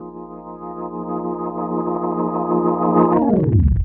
ORGN 123, Keys; Houseworx

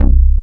TYPIC C3, BASS; Best Service Dream Experience

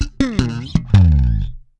FUN FILLED, Bass, 80 BPM; Sampleheads; Ultra Freakin' Bass

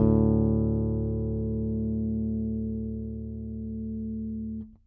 Fifth One Shot DA Long, Bass; Bass X

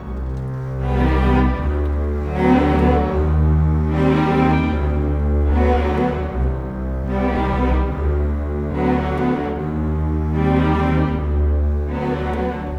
Cinematic Strings, Orchestral

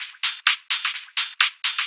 Hihat Loop 128 BPM

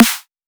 more snare

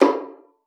VIOLIN; Xsample VOL-5; 90 s Sample CDs; VIOLIN FING

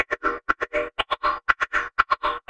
MUTANT, pads

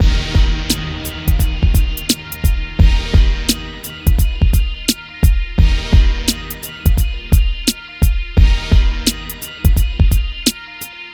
MIX, Hip Hop and R&B Drumloops; Best Service ProSamples vol.01; AKAI

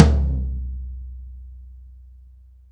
TOM E, Drum Dry; East Collexion; Drum 1

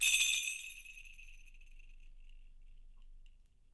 SHAKE, Percussion; Miroslav Vitous

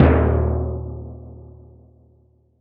Timpani, Percussion

